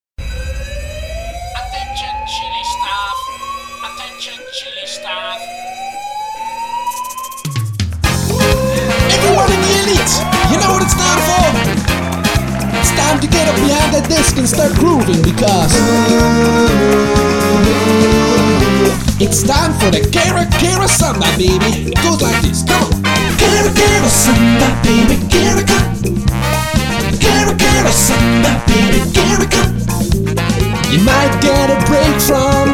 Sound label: Samba